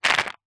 household jewelry earings new on card several short movement shake